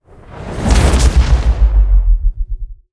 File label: FTL exit; Star Ruler